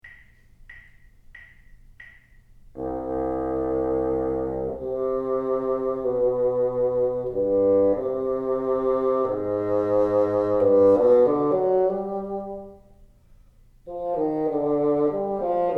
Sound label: teacher, bassoon